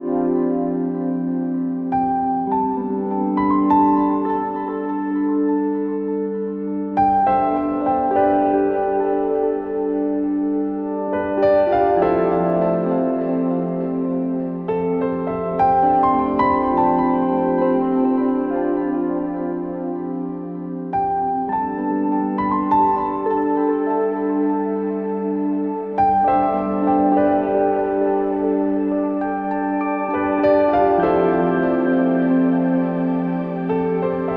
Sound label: Piano Dreams